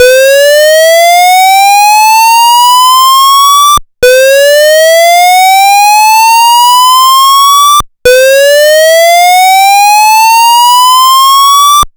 Slow whoop, Australian AS2220 (evacuation alarm); pfannenberg; signaling-technology; combined-signaling

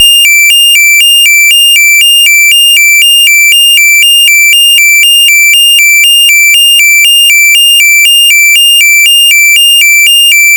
Alternating, combined signal; Alternating tone; pfannenberg; signaling-technology; combined-signaling

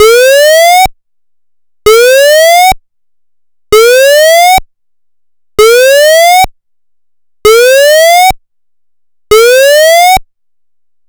Slow Whoop NFPA, signaling technology; pfannenberg; combined-signaling

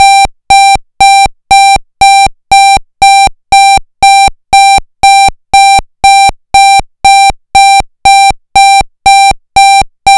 Interrupted, combined signal; Interrupted tone; pfannenberg; signaling-technology; combined-signaling

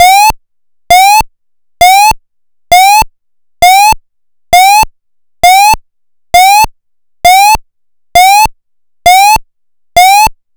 Interrupted, combined signal; Interrupted tone; pfannenberg; signaling-technology; combined-signaling

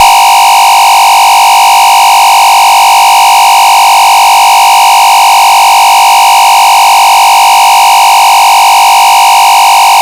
Sweeping fast, combined signal; pfannenberg; signaling-technology; combined-signaling

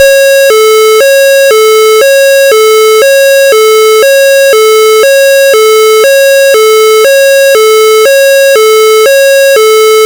Alternating Sweden, Sweden SS031711; Alternating tone; pfannenberg; signaling-technology; combined-signaling

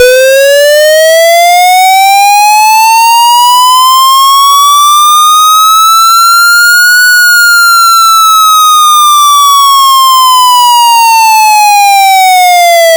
Sweeping Finland

